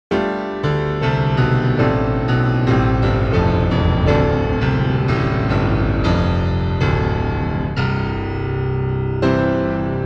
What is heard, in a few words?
even growlier bass, piano